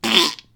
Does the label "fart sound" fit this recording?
Yes